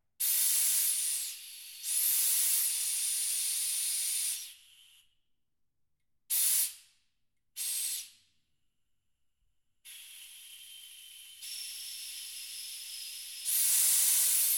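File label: Air Cylinder; Trevor Webb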